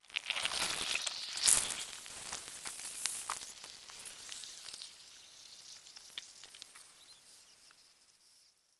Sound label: gore squeeze flesh with squealing air pressure; sonniss gdc